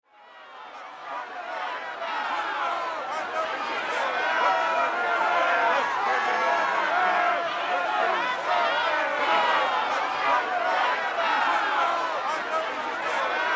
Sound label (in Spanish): manifestacion sonido ambiental